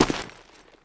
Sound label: gravel, footsteps; Counter-Strike Global Offensive